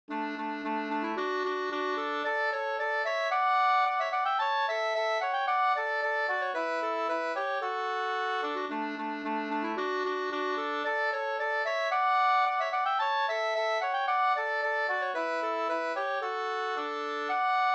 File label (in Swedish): polka, 2st